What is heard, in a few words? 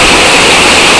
wfall; ROADWARS